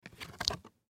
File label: Book Cardboard Open; Books; Foley; Offices; Blastwave